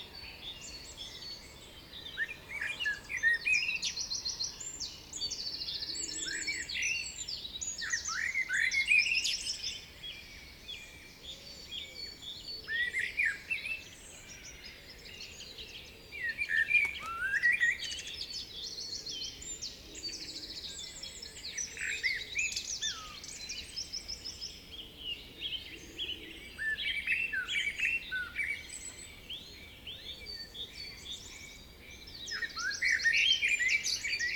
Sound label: birdsong; MinetestAmbience; birdsongnl